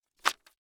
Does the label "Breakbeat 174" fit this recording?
No